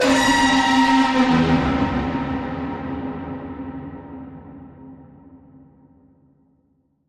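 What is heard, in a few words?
Creature; Cryptoid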